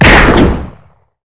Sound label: usp; AssaultCube; weapon